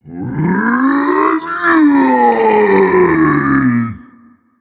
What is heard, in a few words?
Vocal Colossus Scream